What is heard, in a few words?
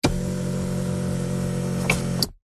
vehicles car luxury electronic seat adjust motorised